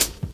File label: KIT, Dance Mega Drums, HIP; Best Service